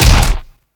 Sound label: gambling close; DinoReels81WD; wazdan